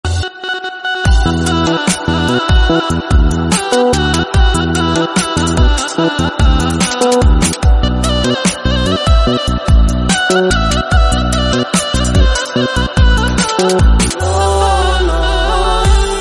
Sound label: turkish instrumental